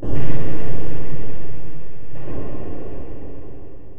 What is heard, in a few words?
distant banging, factory sounds; svencoop